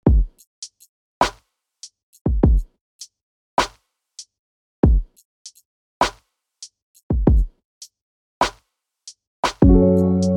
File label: Warm Hands